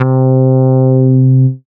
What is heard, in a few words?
synth, Euro Style, Dance Synths; Best Service ProSamples vol.37 - Dance Synths